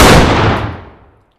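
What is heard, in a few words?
fire distant 338lm rifle interior; gmod; arccw uc commons attachments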